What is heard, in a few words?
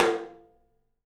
tom, studio toms; Percussives; 3D Percussives